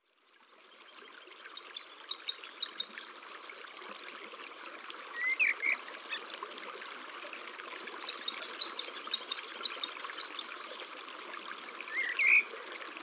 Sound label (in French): Mesange charbonniere, Chants d oiseaux dans la campagne